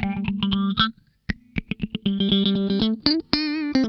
Funk Guitar; 90 s Sample CDs; Zero G

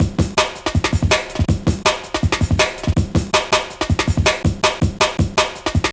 Cool Break, Drum Loops, Jungle, 162; Jungle Warfare 1&2

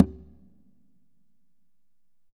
Congas wet, drums percussion

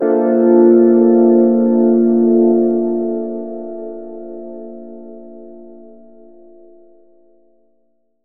Glitchy Organ Bed; Zero-G Sound Sense Grime Scene; 90 s Sample CDs

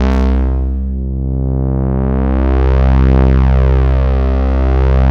SYNH, SYNBRASS; Synthesizers

